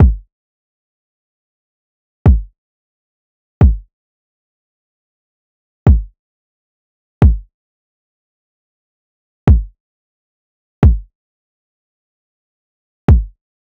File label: kick 133, Kick Loops; drum sample pack; Adatara; SEISMIC; Slate